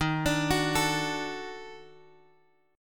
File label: D sharp Minor Major 7th Flat 5th; D#mM7b5 Chord {X 6 X 7 7 5} arpeggiated